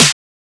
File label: Snare, techno drum shot